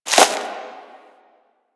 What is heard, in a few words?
Tree Branch Break; Ambience; One Offs; Warframe; Quests; The New War; Drifter Camp